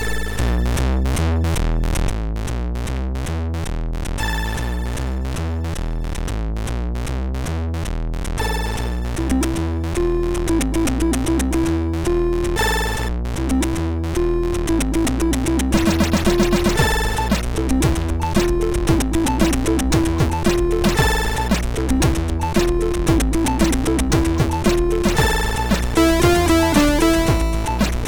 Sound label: Spectrum tune MOS6581R2